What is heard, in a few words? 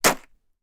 Stab Metal Knife In Lettuce